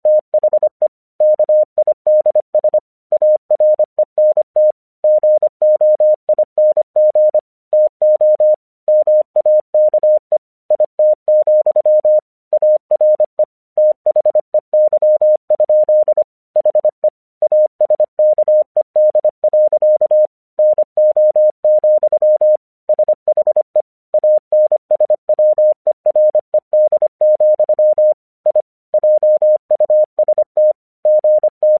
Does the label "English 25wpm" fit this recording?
Yes